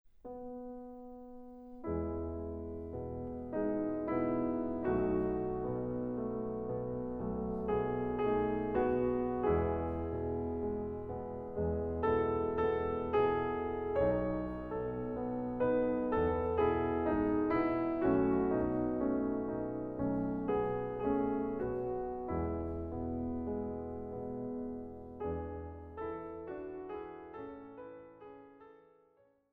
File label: Etude in E major; chopin